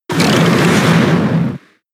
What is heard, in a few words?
Howl; pokemon sfx gen 7 attack moves sumo usum